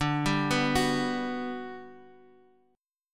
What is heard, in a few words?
G Major 7th, D